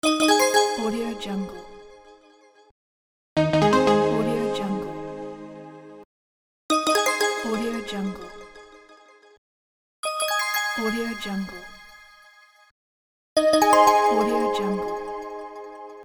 alert tone

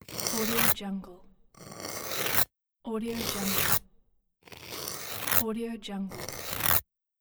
deep fast ice scrapes

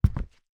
footstep wood boots resonate dry interior

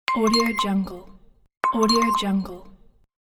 pleasant notification

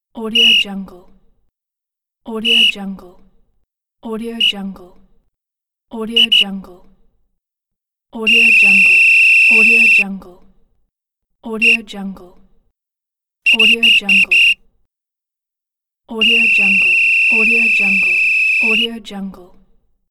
sports whistle

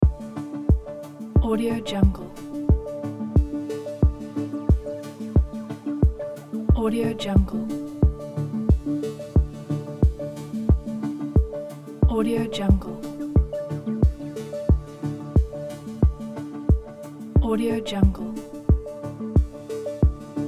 game main menu theme loop

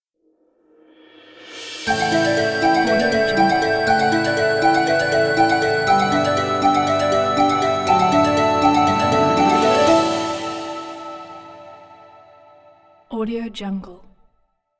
enchanted forest logo

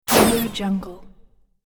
comedy death cartoon game app

